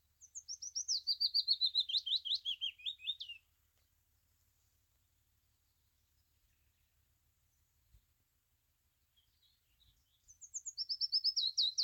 willow warbler phylloscopus trochilus

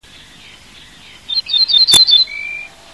emberiza hortulana

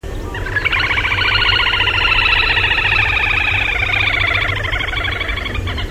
tachybaptus ruficollis

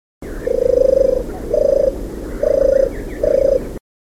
streptopelia turtur

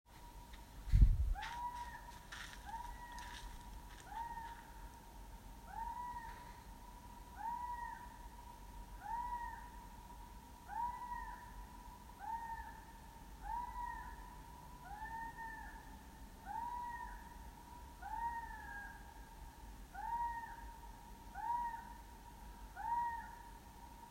bird animal sound

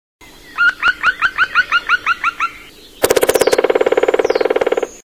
dryocopus martius

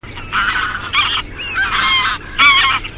anser anser

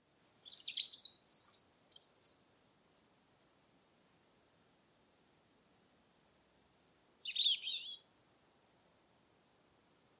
european robin - erithacus rubecula

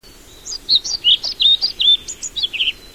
ficedula hypoleuca

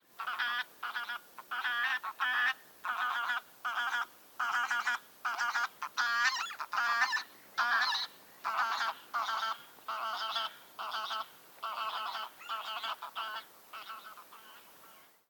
greylag goose - anser anser

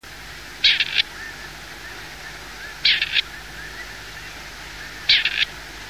perdix perdix

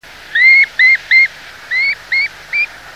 charadrius morinellus

